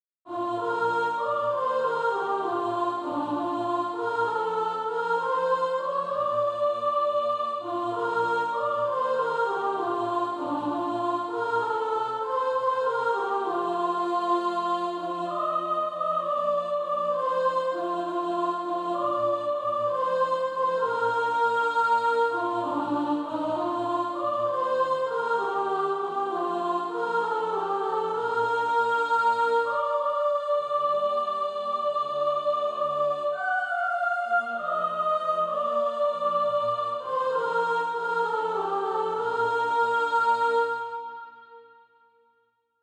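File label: soprano, SATB Practise Music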